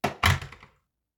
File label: door close